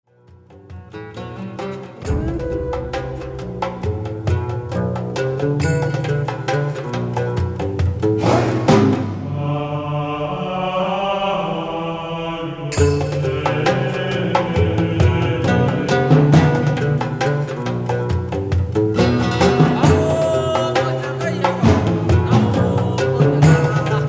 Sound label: Tribal; film scores